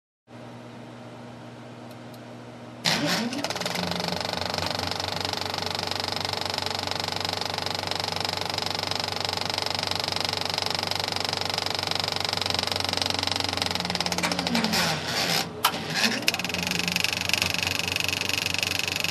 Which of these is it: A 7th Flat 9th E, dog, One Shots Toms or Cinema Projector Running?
Cinema Projector Running